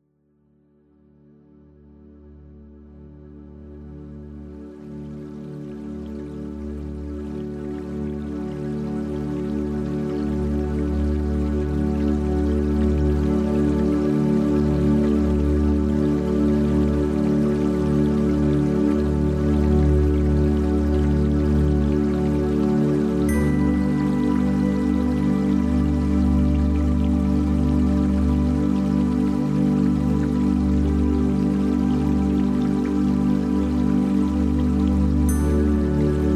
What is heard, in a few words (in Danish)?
Mindful Stream Musik; mindfulness musik